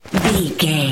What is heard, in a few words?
foley jump movement throw